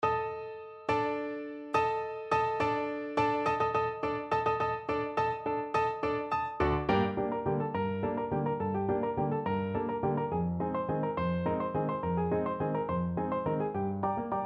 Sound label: danse macabre, piano duet